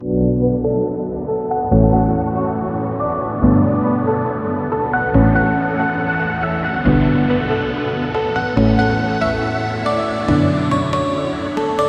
Sine, Bell, Pluck, chord progression with slow melody, B minor, high reverb, rising low-pa; rising low-pass, 8 bars; EDM; Audialab EDM Elements